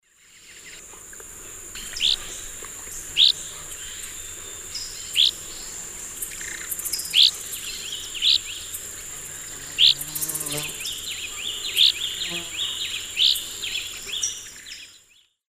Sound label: Tachycineta albiventer, flight calls; S. America; Eastern Lowlands